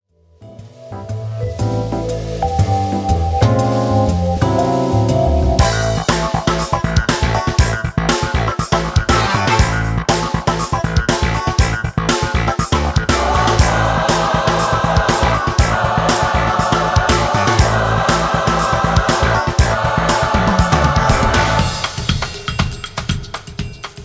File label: VINTAGE COMP 670 HARD LIMIT; t-racks